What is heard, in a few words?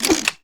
smoke grenade; LoL; graves